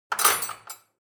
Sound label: Cutlery placement